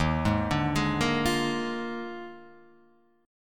D sharp Major 7th Suspended 2nd Suspended 4th, Guitar, Half Step Down